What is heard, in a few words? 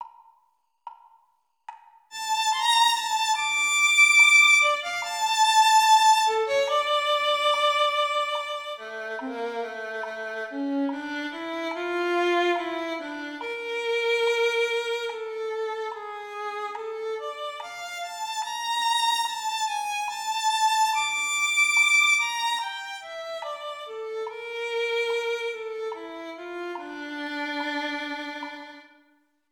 Violin; All-County